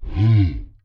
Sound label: Tower Soldier Sasquash Rally